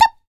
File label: HIT, Jazz FX FEM PERC